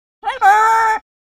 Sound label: Macaw Vocalizations